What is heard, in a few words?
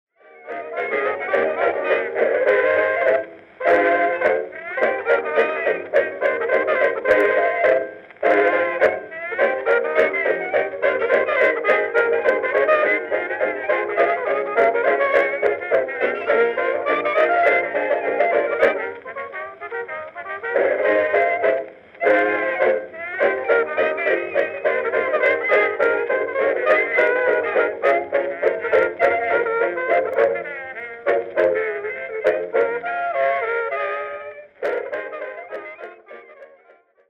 blues